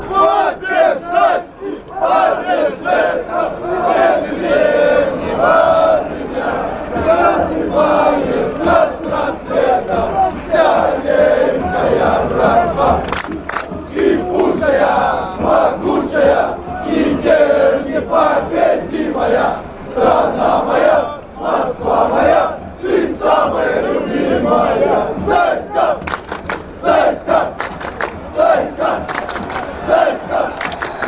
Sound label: cska chant, fanchants